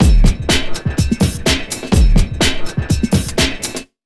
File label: BEA, Big Beat Drumloops; USB Soundscan vol.25; AKAI; NYC 125